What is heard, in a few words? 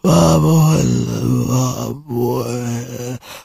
zombie die; monsters